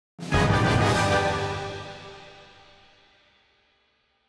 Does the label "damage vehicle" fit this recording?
No